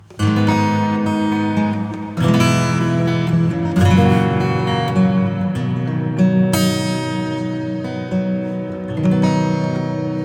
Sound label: acoustic; XenoVerb; Aqua